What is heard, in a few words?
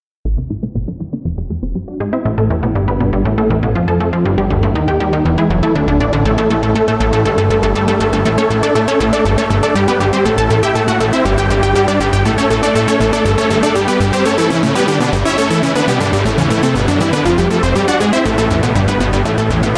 trance; Boulder Dash